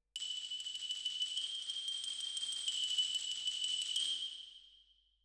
Xylo

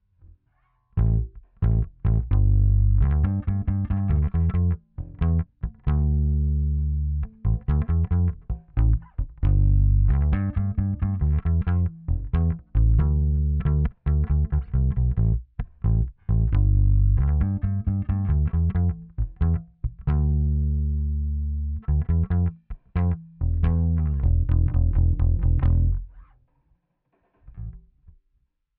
Bass Amp

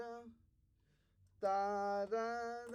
Trumpet

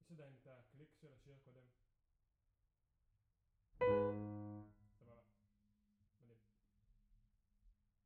Piano B; Piano Workshop Rec

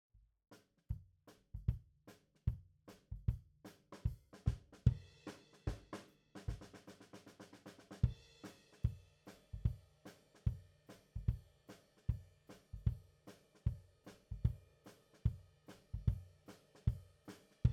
Kick out, Ragtime

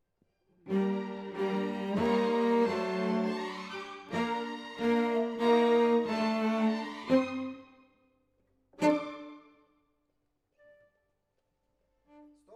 Cello Solo

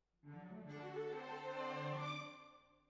Cbasses

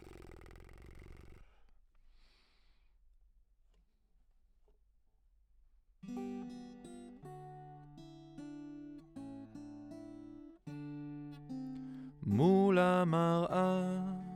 Lead B

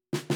Ride

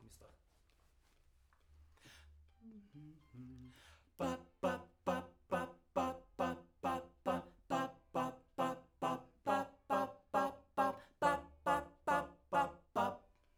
Backs SCHOEPS

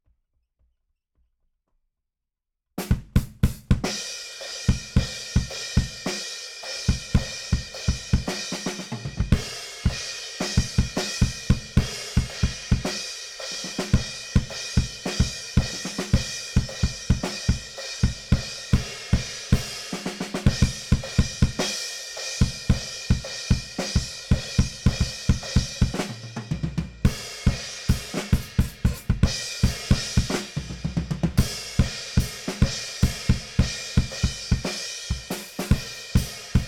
BD Out

